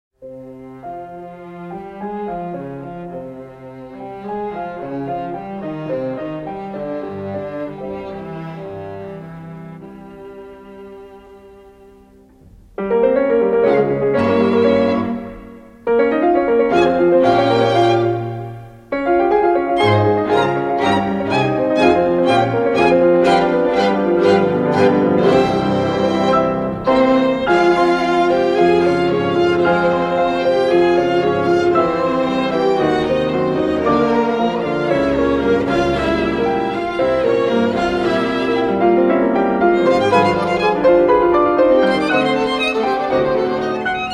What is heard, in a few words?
Quintet; Brahms